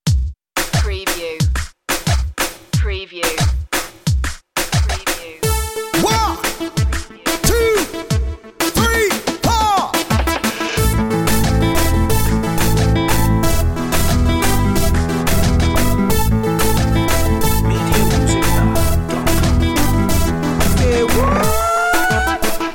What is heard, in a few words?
reaggaeton